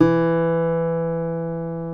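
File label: D4 CLASSIC, CLASSICAL GUITAR; Northstar - New Gold VOL-2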